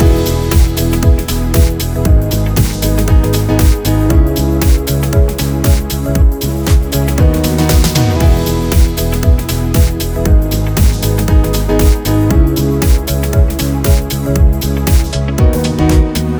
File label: Dream Sky Loop; Motion-Array-Dream-Sky-Loop